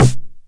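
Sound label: 909 SD Tape, snare drum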